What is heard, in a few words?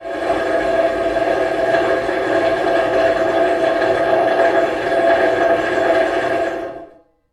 muffled power drill, Recorded outdoor in open field